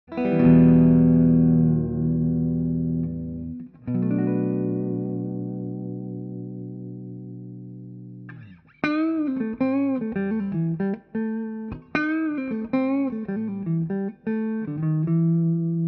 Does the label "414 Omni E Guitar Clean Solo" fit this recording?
Yes